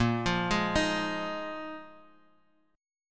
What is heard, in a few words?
Bb 7th Suspended 4th Bb 4G,5C,5E,5A